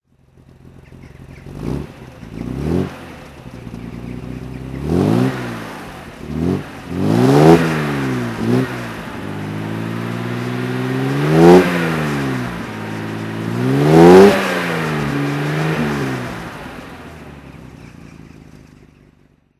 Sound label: austin healey 3000 mk3 ph2 throttle